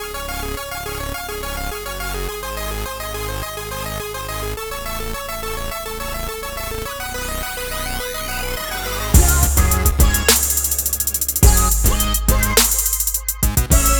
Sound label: 8bit Trap